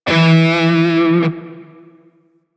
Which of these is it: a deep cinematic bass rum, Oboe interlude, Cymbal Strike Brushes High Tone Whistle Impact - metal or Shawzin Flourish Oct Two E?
Shawzin Flourish Oct Two E